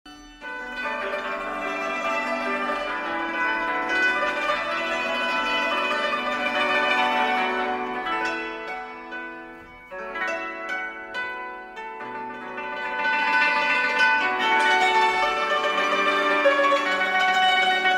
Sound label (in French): tympanon chinois trio; frappees